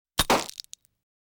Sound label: flash bulb